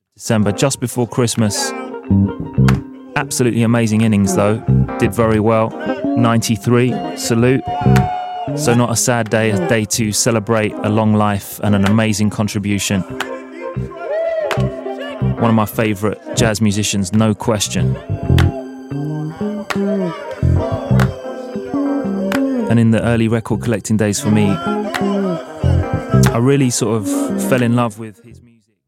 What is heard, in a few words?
african rhythms